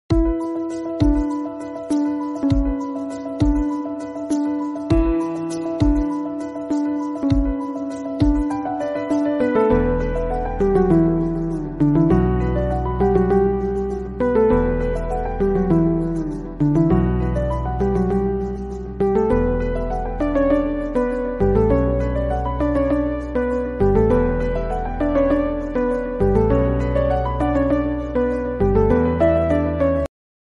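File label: flute background music; ashok selvan; janani iyer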